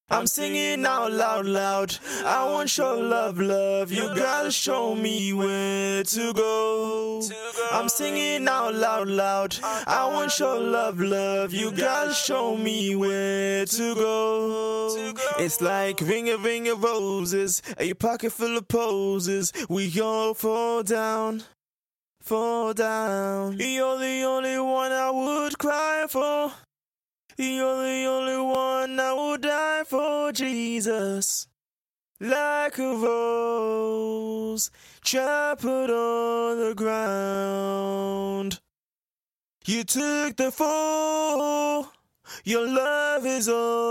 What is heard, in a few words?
Acapellas, hip hop 63 A sharp